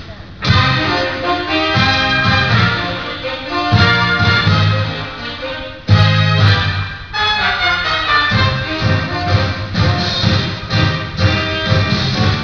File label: tango